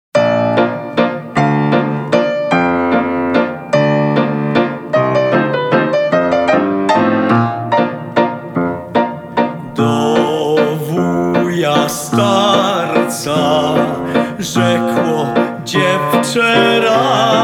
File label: Waltz